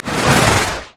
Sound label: surging tides; nami base